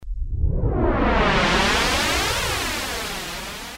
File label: Auto Release Noise Sweep, Roland Juno-106; Factory Preset